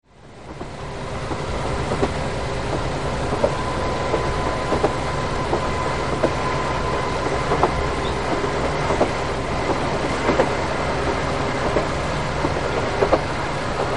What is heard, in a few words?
kiha65